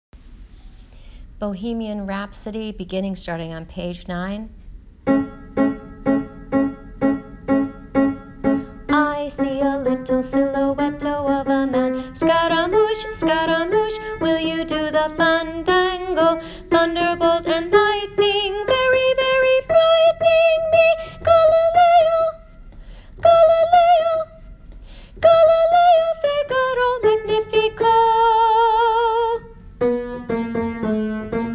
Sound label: Soprano